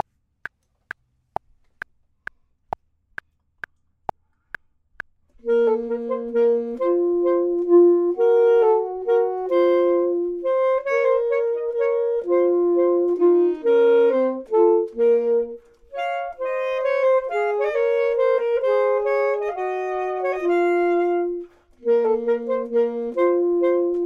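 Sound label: both, Saxophone and Clarinet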